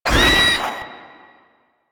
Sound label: Proto Infested Maggot Attack, Enemies, Vocals; Warframe